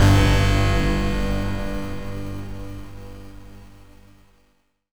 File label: bell hit